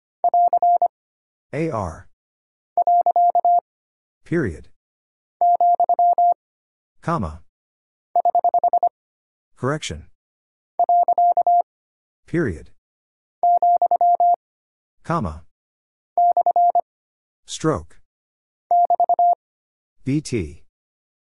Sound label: Single Punctuation Prosign - 25wpm, morse code practice